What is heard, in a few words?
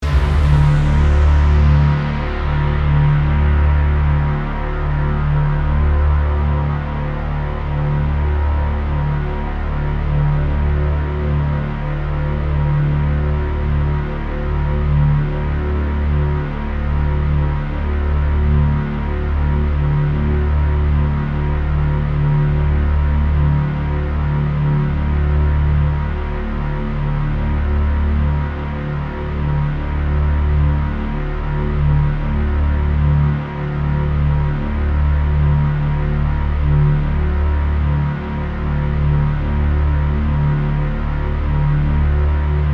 Drone Synth Stab, Long; Production Element; Imaging Element; Accent; Transition; Blast Wave